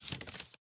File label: Paper Turn; Lethal Company